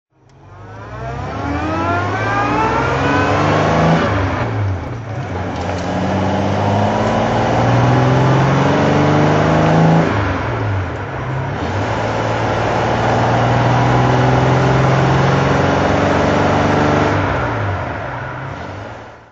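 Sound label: jaguar xk150 34 s ots drive, Engine sound driving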